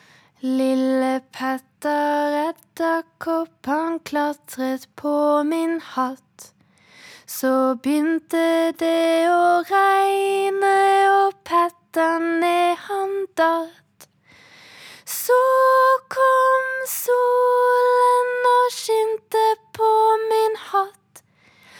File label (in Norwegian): Acapella; Rolige Barnesanger; Lille Petter Edderkopp